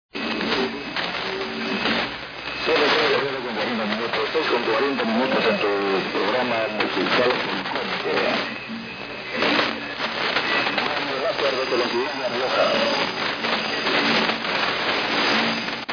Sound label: Radio; Radio Laser; PRU